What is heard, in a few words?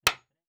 Nail; Fantasy Sounds Bundle; Craft